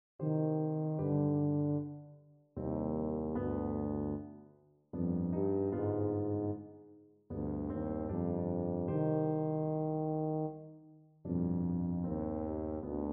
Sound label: 1st mvt four seasons, tuba